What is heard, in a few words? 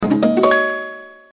Notifier Ready; Blackberry Bold